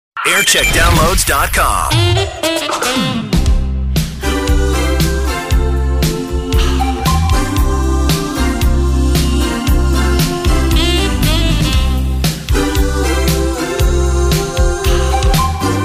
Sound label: Jingle Montage; Channel 103; JAM; 1990s